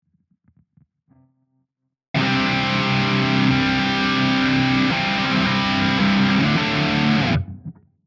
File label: guitar on